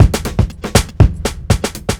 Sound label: chemical 120, loops 120bpm